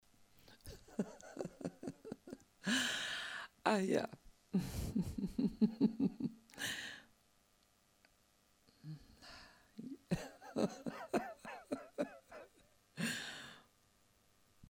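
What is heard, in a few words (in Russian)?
улыбка и смех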